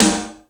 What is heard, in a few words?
acoustic snare a key